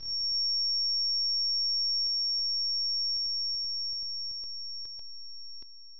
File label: ear ringing; svencoop